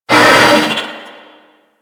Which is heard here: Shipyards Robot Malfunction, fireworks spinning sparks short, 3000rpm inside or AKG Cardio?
Shipyards Robot Malfunction